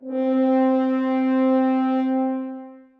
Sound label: horns leg C4, Brass; BBC